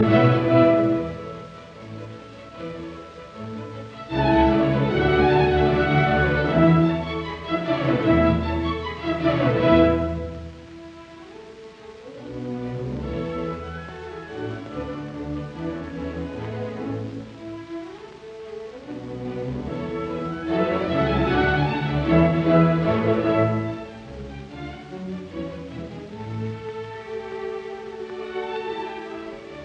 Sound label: Grand Overture in D major Rondo Presto; J.C Bach; Op 18 No 4; Carl Schuricht